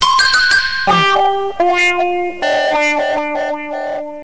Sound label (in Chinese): 开场; LockChime; 哆啦A梦; Tesla x 极氪 x More